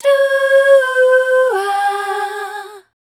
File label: Voice Spectral MELODIC C2